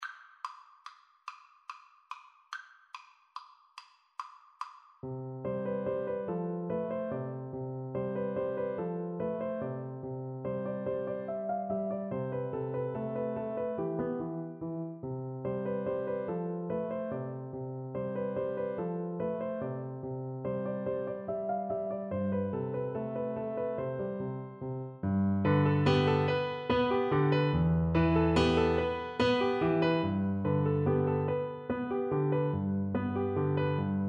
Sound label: lakme viola